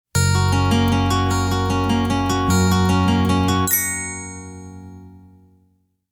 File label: ringtone